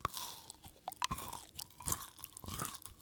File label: eat; Golden Crayon Sounds